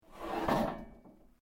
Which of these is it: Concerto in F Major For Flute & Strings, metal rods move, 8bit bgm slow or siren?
metal rods move